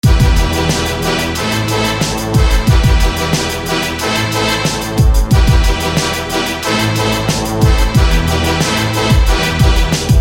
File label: Black brass; SFB FX